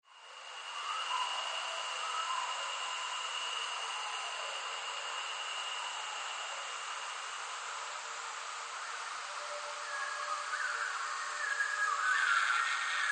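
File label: zvuki nochi, night sounds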